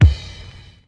bumpin steel kick drum sound d key, kickdrum sound tuned to the D note